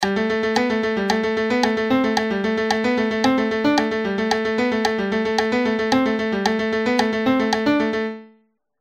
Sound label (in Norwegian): Udelt takt, Vl2